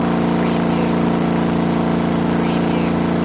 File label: Chevy V8 Hot Rod Engine Loop